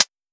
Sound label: JUMP UP HATS; KULTURE